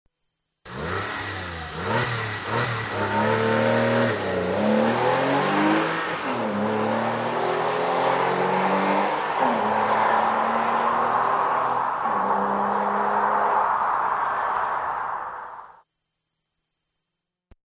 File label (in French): 964 acceleration, Porsche